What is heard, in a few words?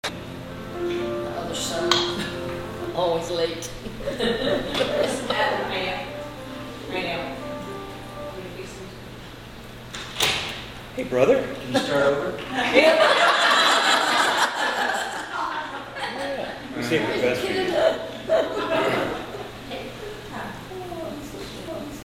Flute